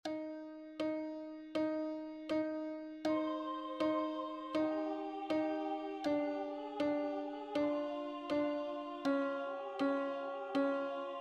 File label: Andantino Chorale Alto Piano